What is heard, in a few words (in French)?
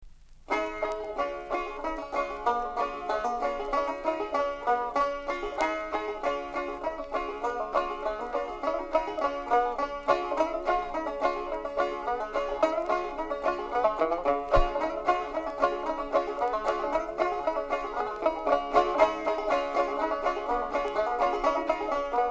Traditionnel banjo